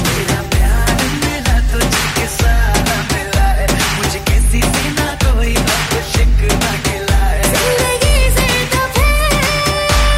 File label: Romantic Hindi Ringtone